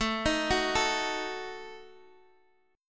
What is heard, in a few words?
D sharp Diminished, Guitar